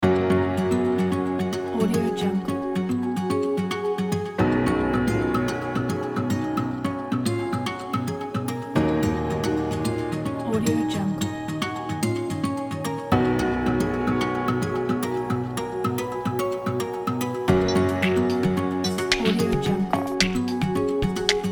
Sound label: electronic corporate tech